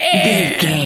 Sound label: bird large squawk